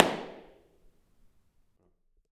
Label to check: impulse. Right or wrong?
Right